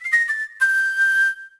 Flute riff

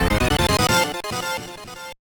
jingle

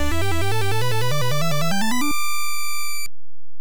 Orb Get

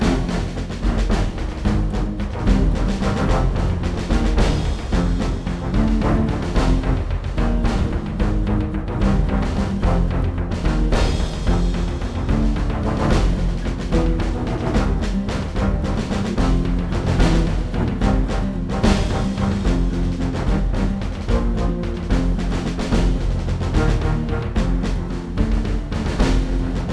Duel Music Bad

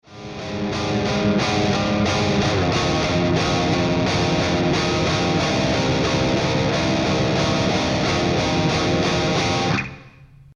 round ten sec count

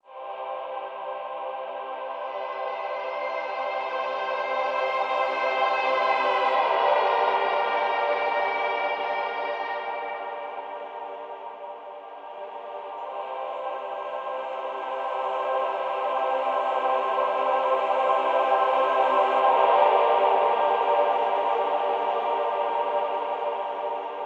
Pad Loop; Headlander

